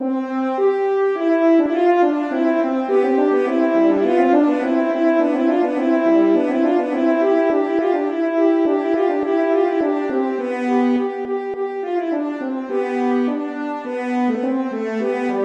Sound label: Allegro; Telemann; Canonic